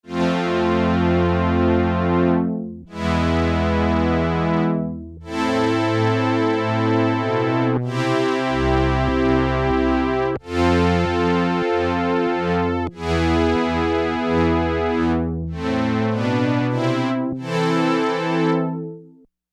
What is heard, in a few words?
Analog Cellos; synthesizer; Guess the synthesizer game